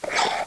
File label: masonry trowel wall